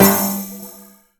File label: menu hit; tetrio